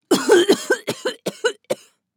Young woman coughing

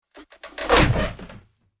Designed cinematic wood whoosh

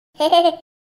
Baby child single laugh

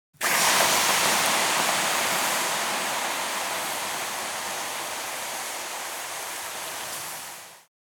Fire extinguisher spray long

